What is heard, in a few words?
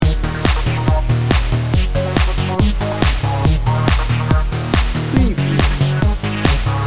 dancing divas loop